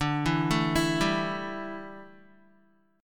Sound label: D Suspended 2nd Suspended 4th D down Guitar